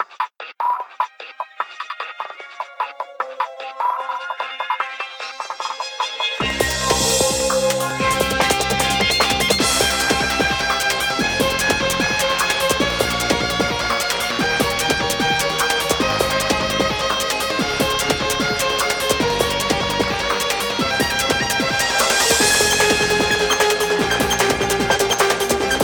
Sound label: Winter, ringtones; Samsung; Galaxy A SHW-M100S